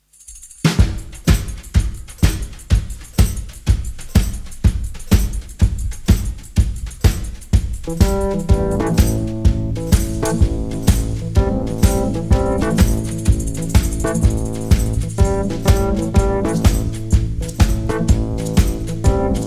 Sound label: Acoustic